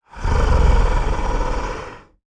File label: monster growl